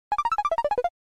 Arcade Action Miss

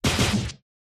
Cut

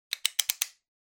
Cutter Click

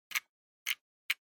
Camera Compact Focus

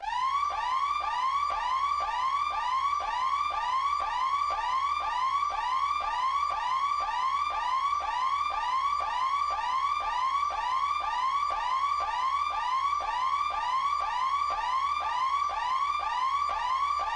Warning Siren

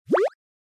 Motion Pop

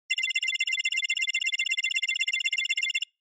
Cyber

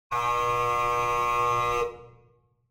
Opening Buzzer

